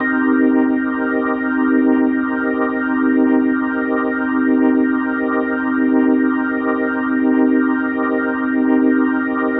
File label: Medical Organ C Min7; Minor 7; The Vitale Organ